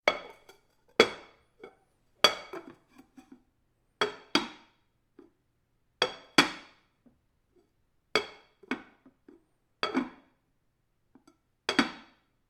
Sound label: porcelain bowl counter series